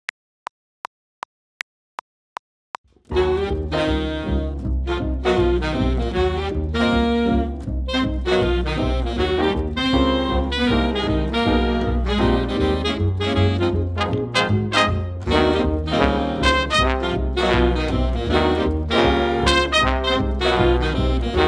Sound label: Dance Party, Drum Audition Track; Manhattan